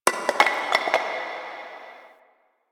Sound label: Stone Debris; Ambience; Warframe; The New War; Quests; Zariman